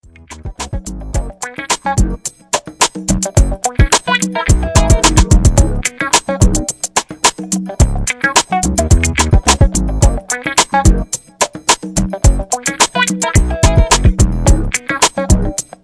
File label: funky